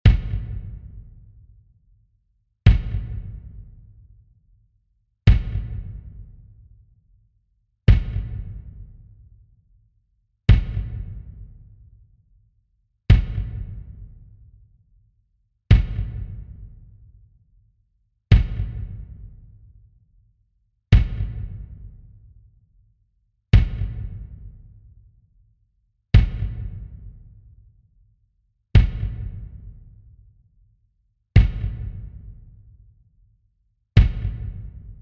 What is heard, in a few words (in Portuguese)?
Toque - Bumbo; fanfarra